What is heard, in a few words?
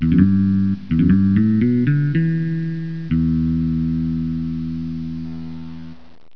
guit bass